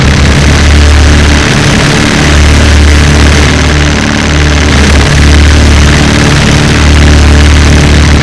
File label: zombie butcher, ambience; brainbread